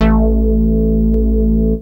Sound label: dance, bass; Techno Trance Essentials